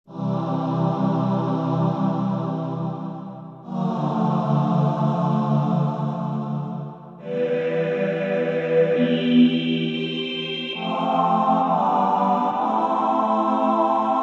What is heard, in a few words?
Choir Synthesizer